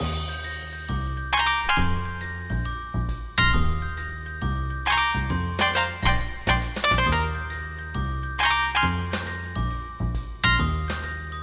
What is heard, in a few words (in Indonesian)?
Nada Dering Nokia Jadul; Slowdown